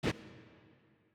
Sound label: kaiju superweapon energy initiate; biogenesis